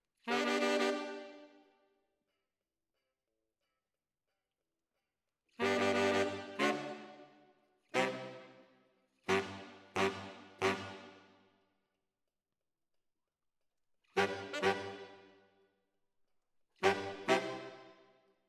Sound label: ORTF SCHOEPS, piano